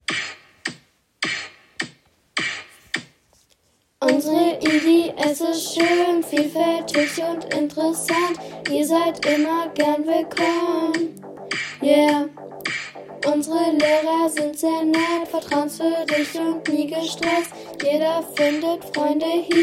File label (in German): Schulsong Musikclub; IGS Hanstedt